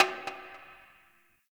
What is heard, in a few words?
Garbage Metal Can